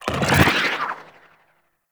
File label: Staff Jab End; Warframe; Monkey King